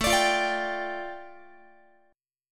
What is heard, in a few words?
A 7th Suspended 4th Sharp 5th, A, down, Guitar; A7sus4#5 strummed